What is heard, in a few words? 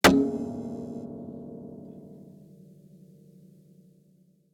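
zither string plucks contact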